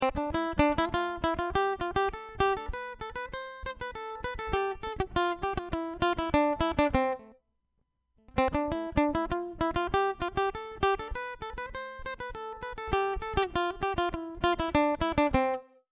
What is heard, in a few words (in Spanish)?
C Escala Tresillo Back